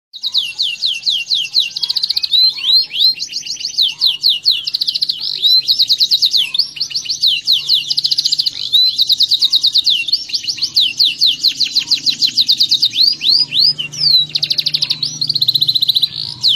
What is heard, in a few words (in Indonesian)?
suara kicauan kenari standar